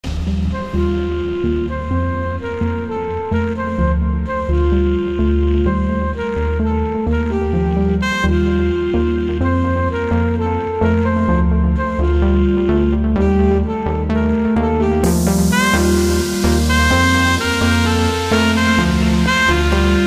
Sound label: tropical sax